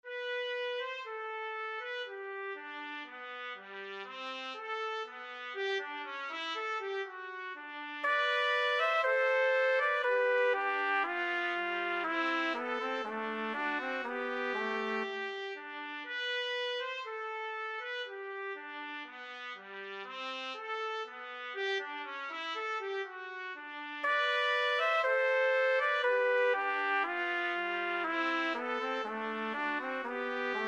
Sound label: 2 trumpet, trumpet duet